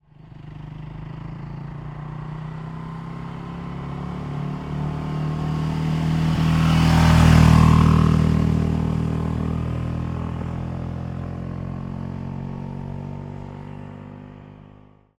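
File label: vehicle motorcycle beta 520 rr exterior pass by slow accelerating; mono; ntg3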